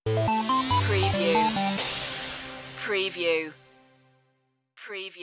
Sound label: singing robots stinger